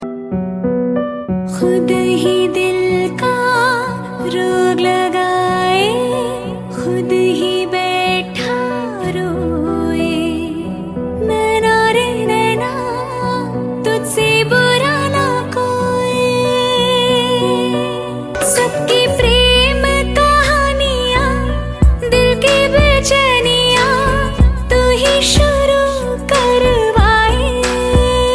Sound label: Female